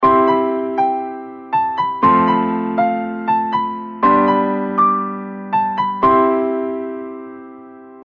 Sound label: C F G C melody